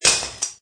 Metal Wrench fall; Metallic; Multimedia; Lynne Music

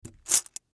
Piggy Bank Pick Up; Money; Foley; Blastwave

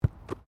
RUGBY BALL BOUNCE CATCH; Various rugby sounds; Miscellaneous Sports

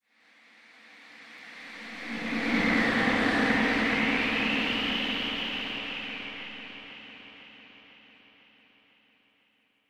Graveyard Wind; Scary Soundscape Sound Effects

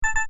Menu wrong, Wrong / Invalid Selection; Menu SFX; Buttons; Multimedia; Stuart Duffield

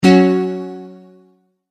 multimedia tone exclamation; McKinney Sound

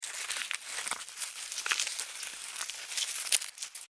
Insect Dig Dirt, Insect, Dig, Dirt, Bug, Movement; Animals:Insects; Miscellaneous Insect; Blastwave

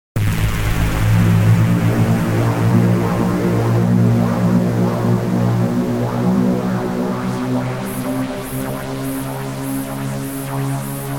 Warp Speed, Full speed ahead warp power; Tech

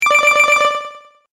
Game Echo Bonus, Video Game Sound Effects

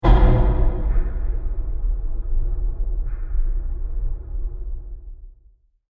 Long Diffuse Deep Impact; Low Impacts; Sound Effects:Impacts; CraftedSignals

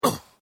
Pain Umph, Male Painful Grunt, Hurt; Grunt Sound Effects; LYN116 package of human sound effects

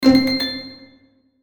Hit Orchestral, Hit Orchestral Stab Twinkle; Blastwave

